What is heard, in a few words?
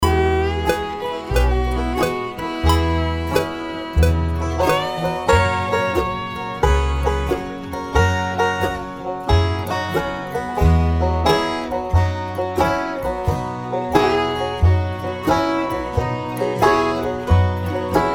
banjo solo, half